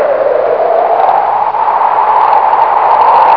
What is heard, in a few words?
howling wind